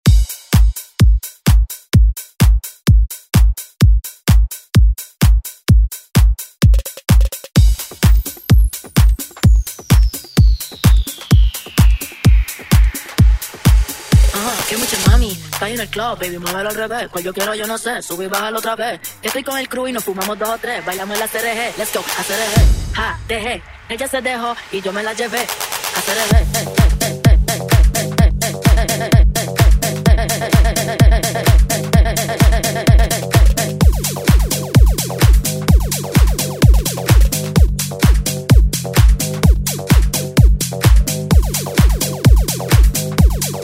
Tech House; Deejay Hit

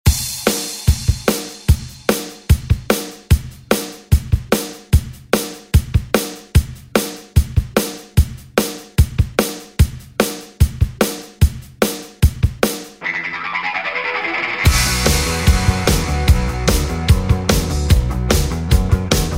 80s ReDrum; DJ Mhark

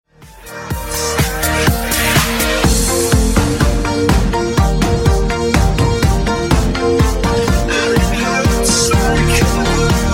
Funky Remix 124Bpm

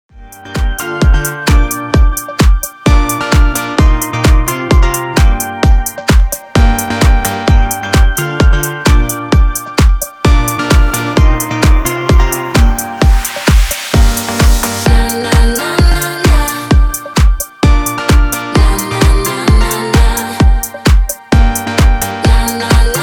130 Bpm

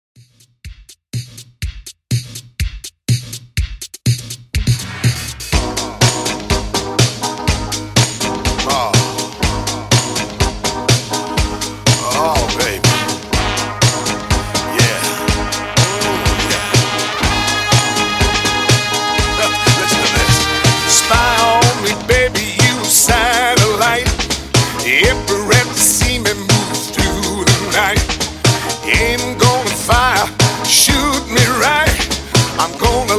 Extended Redrum 123 Bpm Clean

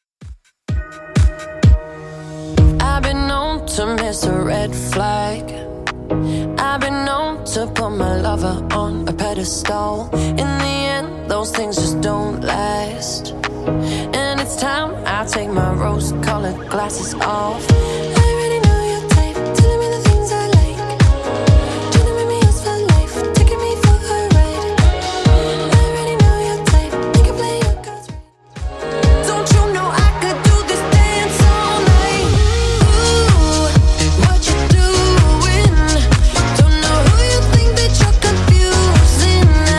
Top40 Redrum 127bpm

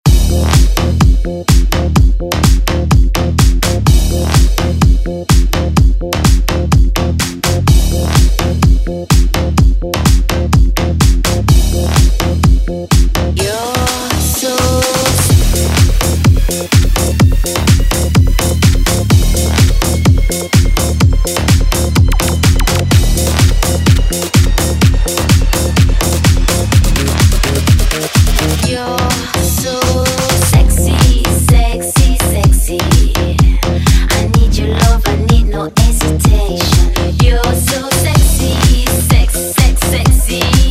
90s Dance Remix

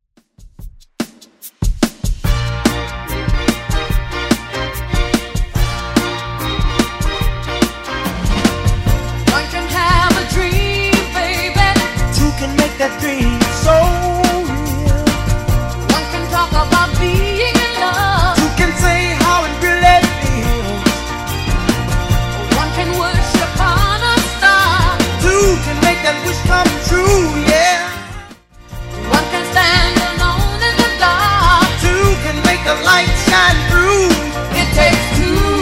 60s 145 bpm; Redrum

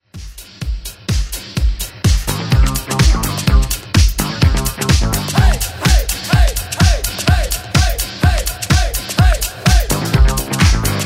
Drums On Fire

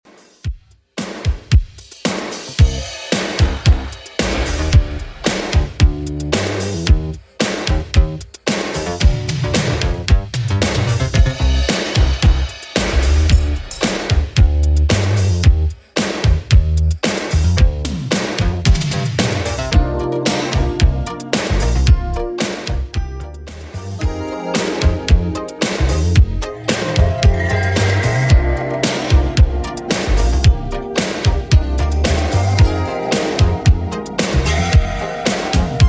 80s Redrum 112bpm

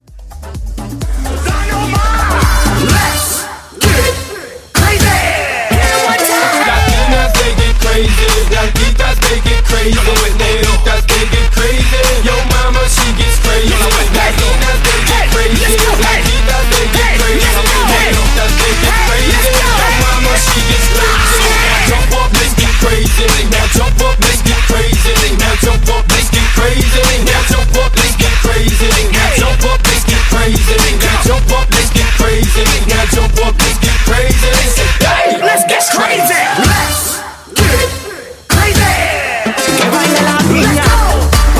Mashup Ext. ReDrum 128 BPM

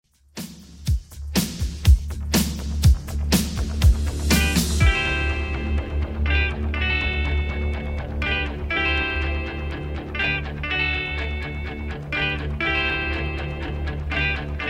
80s Rock Redrum 122bpm

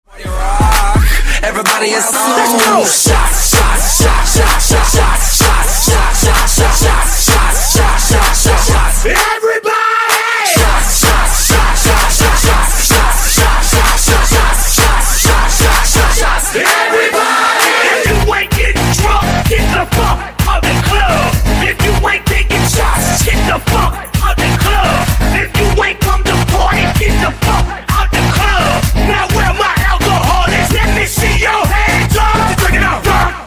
Mashup Basshouse

